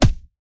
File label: Player Attack Hit